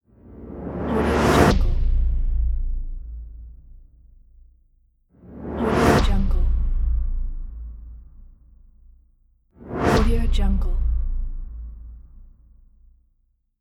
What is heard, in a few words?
whoosh